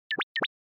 Anime Motion Phrase

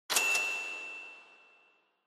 Cash Register Drawer High

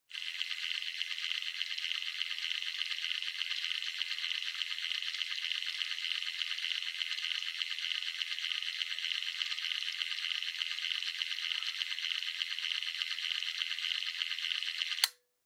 Outlet Timer Operating Noise; タイマー機器

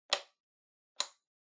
Megaphone Hardware Trigger Switch

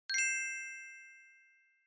Glocken High

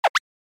Motion Pop

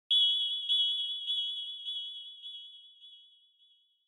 Onoma Flash (High Delay); オノマトペ 光る; 高 ディレイ

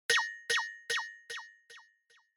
Surprise Low Delay; Onoma

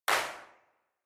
Handclap Synth

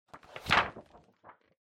Calendar Flip

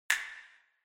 Finger Snap Foley Reverb

